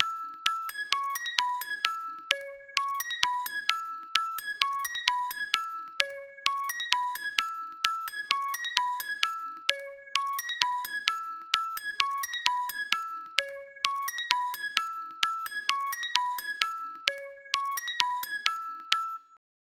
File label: chinese music box 130 bpm